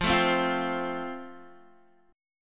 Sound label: F Major, Guitar; strummed; 13,12,10,10,12,x